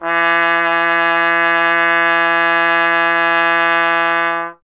Trombone E; Miroslav Vitous - Solo Instruments VOL-1

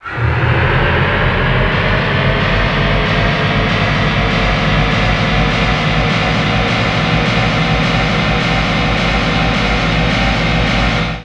NOISE, Production Effects

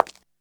FOOTSTEP

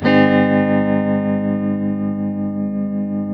TELE CHORDS MAJ A, Guitars; Spectrasonics; Hans Zimmer Guitars VOL-1

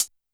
HH Cl Ana, YAMAHA RM1X

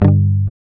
G, Acoustic; Sound & Vision; Gigapack I CD 2; GUI ACOUST.; GUI Acoustic 1

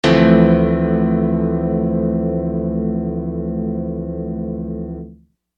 Acoustic Piano; Construction Kit; HIP-HOP; Sonic Foundry (Sony Creative Software)

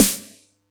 Yamaha EX-5

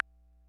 Ace Tone Rhythm Fever Perc, Drum Machines; 300 Drum Machines

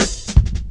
Drum Loops, Jungle

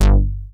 BASS G1; Best Service Dream Experience; R100M BASS

